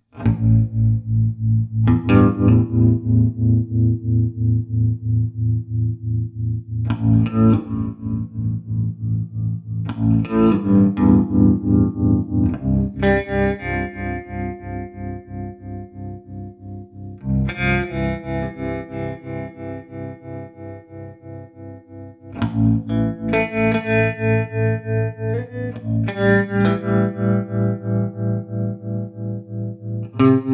Bari Clean Tremolo; Swart30; super 30

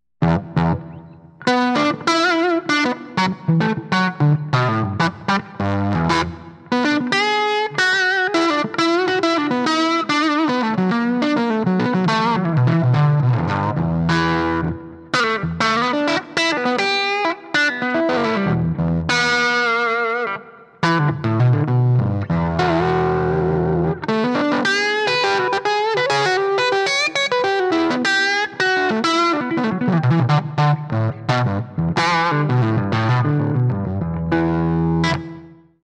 LP neck low gain; space tone

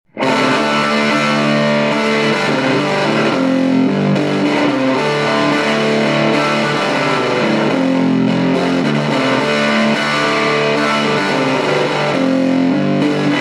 Room LP high gain; space tone

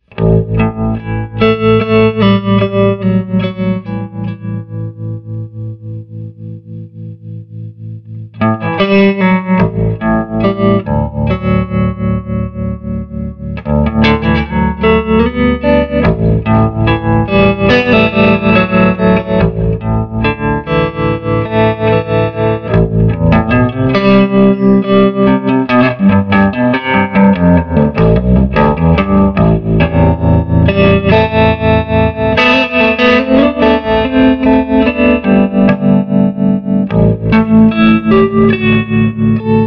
baritone clean trem; swart ast pro

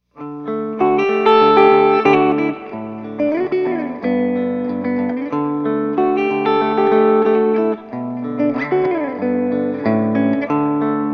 space tone reverb

